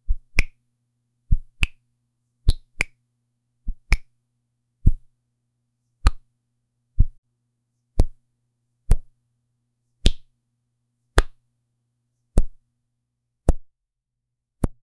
repeated finger flicks; sound effect; body sounds

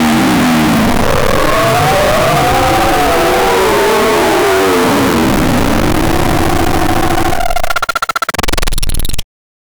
destroy sound, explode

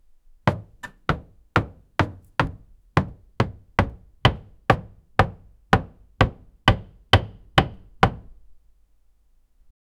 hitting the blackboard, classroom

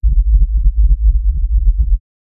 a low rhythmic hum or; attraction

